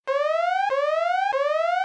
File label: Virus Alert Sms